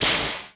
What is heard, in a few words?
TRASH; Crypton; DrumBase5000